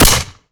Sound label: silenced; garrysmod